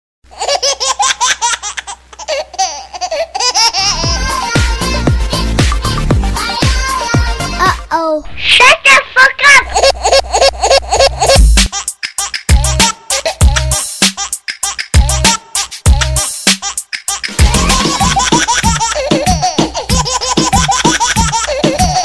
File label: baby rap